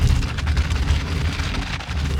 Moving Obstacle Stone; boomerang fu

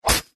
Hit; yet another pixel dungeon android

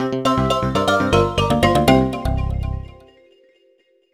Jingle Positive; Virtual Villagers: A New Home; NDS

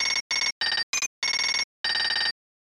Alarm Clock Nah-Nah; The Mask; SNES

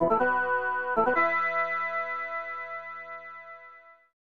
Item Fanfare; bushi seiryuden; snes

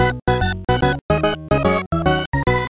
Professional Jingle

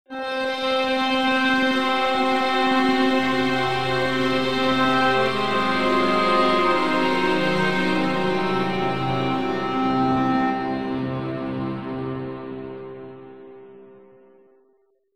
Fail; Sniper Elite Berlin 1945

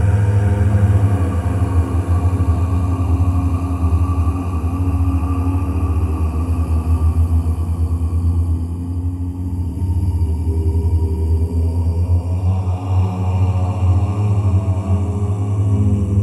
Ghost Choir; conan exiles gamerip 2017

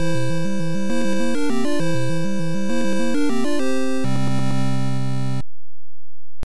When Zelda Is Rescued Fanfare; Zelda no Densetsu

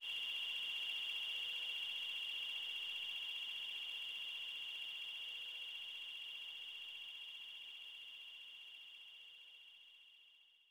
Ambient Microscope; Afterzoom; DSiWare; NDS

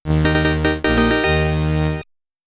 High Score Fanfare

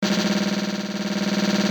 Drumroll; Legend of Zelda Four Swords Adventure HD 2004

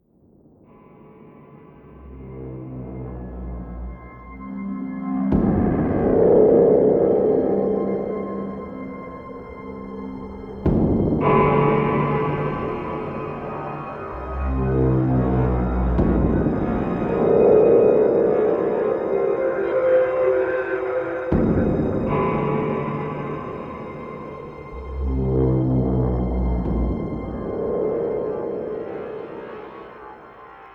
Stinger; Rising Force Exploration

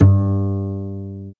G Bass

